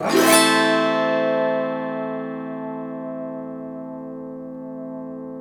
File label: AUTO HARP, Auto Harp Min 7